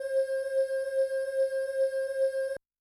Chorus; Yamaha DTXpress-II

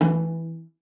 E3, CELLO FINGER; Xsample VOL-5

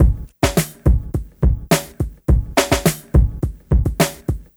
Street Tip, R&B Drums

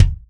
Real Drum Kit, ROCK KIT

